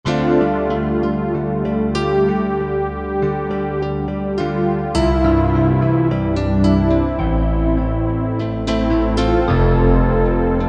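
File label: Piano + Strings, Korg MSC-05S; Piano+Strg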